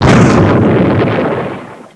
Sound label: explosion grenade; Army Men TiS